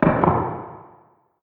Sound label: Random Air Nailer